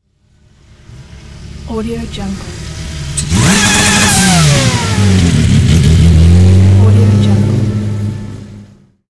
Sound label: rally car high revving slow passby